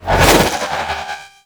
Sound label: Melee Spin; Warframe; Sentients; Sentient Trooper